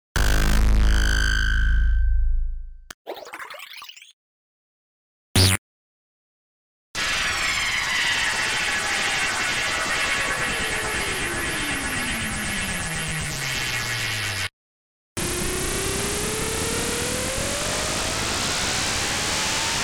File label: Impact FX